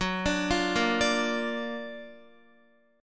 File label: Gb Augmented Major 7th, Guitar; arpeggiated; 4E,4A,5D,5G,5C,6F